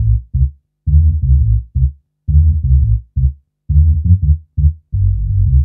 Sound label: Hum Bass; Fluid Dynamics